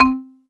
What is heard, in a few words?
Casio CTK-611 Xylophone C4